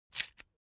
construction machine electric drill template slide out slow